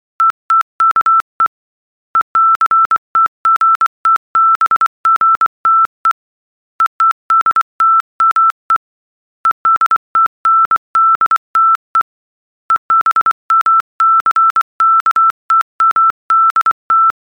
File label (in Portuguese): ritmo velocidade